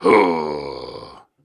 Grunts and Chants, Viking Vocal

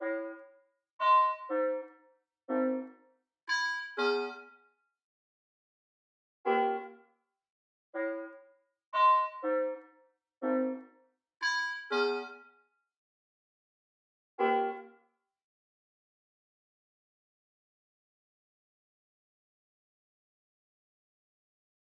121 BPM C# Min Synth; Loop Stems; Cymatics; Ashtray

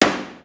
fx pop; Cartoon - film effects

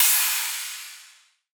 Trap Crash; Drums; One Shots; Cymbals; Crashes; Cymatics; EDM Starter Pack

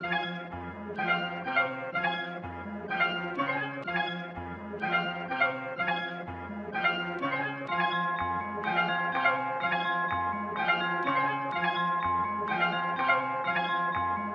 125 BPM F Min, melody; Sparkle Up

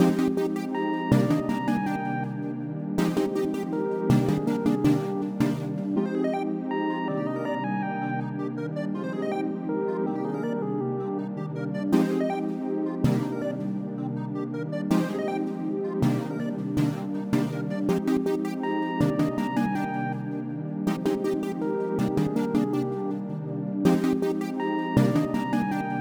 161 BPM C Min; Cymatics; 2022 Melody Collection; Wavy

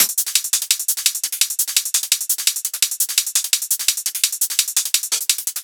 Hat Loop, 170; Drums; Hi Hat Loops; Cursed; Ghosthack; Drum Mega Bundle Demo; Drum Hero 5

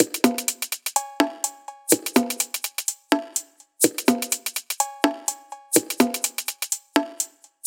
Percussion - 125Bpm, Techno; Percussion Loops; No Look; Ghosthack Advent Calendar - Day 6 - Techno Kit

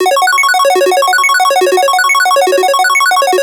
Arp F# 140, Melodic Loops; FastArp; Ghosthack - Color Bass Essentials

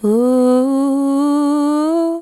Ohoho, Female Vocals Dry; Advent Calendar 2017 Day 1

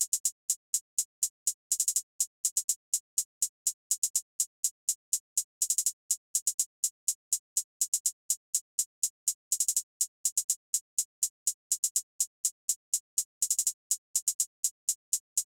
Drum Loop 123 BPM Hihat, Hip Hop; Drum Loops; Loop Stems; Cymatics; Guard Drum Loop

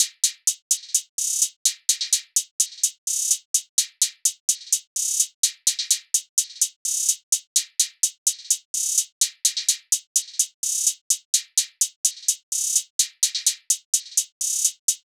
Drum Loop - 127 BPM Hihat; Loop Stems; Cymatics; DOPE DRUMS VOL. 1; Grand Slam Drum Loop

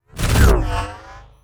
wooshes transform sweetener

